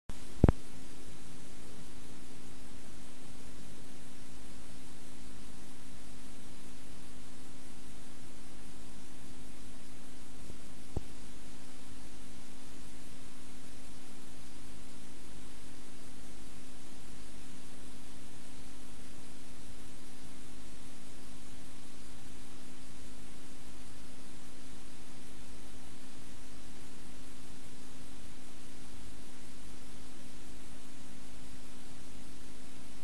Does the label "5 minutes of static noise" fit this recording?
Yes